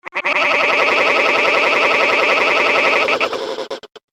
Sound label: Laser Drill Grinds To A Halt, science fiction sound effect